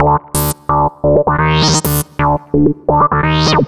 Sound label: Synths, Underground UK House & Electro; Sonic Foundry (Sony Creative Software)